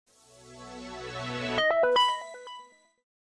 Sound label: Power On; LG KU990